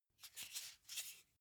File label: car flip key handling